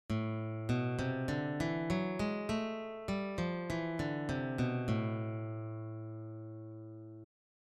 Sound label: a melodic minor scale, guitar